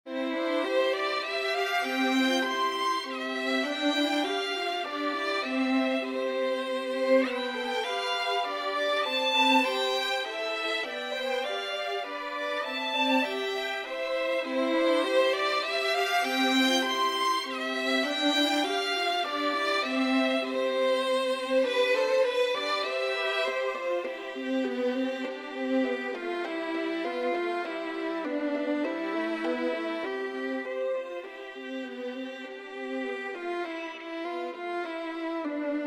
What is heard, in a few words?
Spring Medley, Violin, C Major